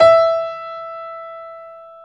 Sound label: piano E4; AKAI S-Series CD-ROM Sound Library VOL-8